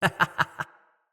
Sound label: Laugh; Fable Of Garren Rood; Deora